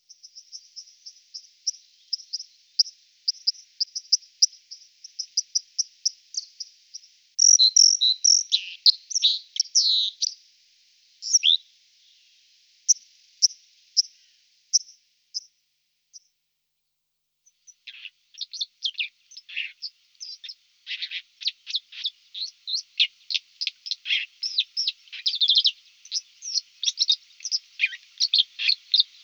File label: Cinclus cinclus, passeriformes